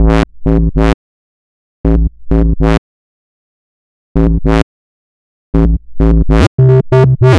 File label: Synth Ultra Bass